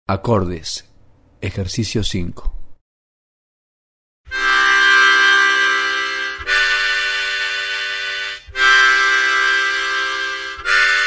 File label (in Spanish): Acordes Ejercicio; armonica; Acordes Ejercicio 5